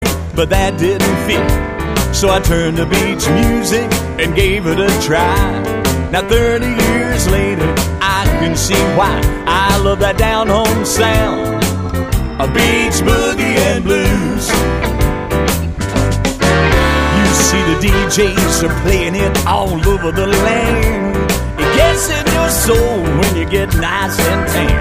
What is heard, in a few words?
beach boogie blues